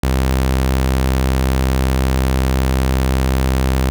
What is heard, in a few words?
mercury c1 saw; 过于稳定的声音; Mercury C1的锯齿波